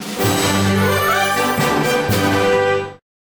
free spin init; Amatic; Admiral Nelson

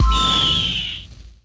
respin wild; Amatic; Arising Phoenix

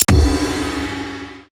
pressed item; Amatic; Big Panda

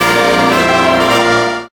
win; Amatic; Book of Fortune

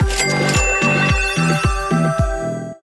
free spin end; Amatic; All Ways Win

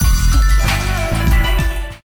win; Amatic; Casanova

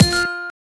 stop bonus; Amatic; Fruit Box

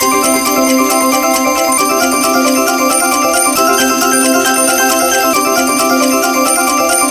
count up; Amatic; Hot Diamonds

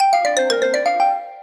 start; Amatic; Chinese Spider

